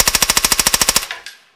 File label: silencer burst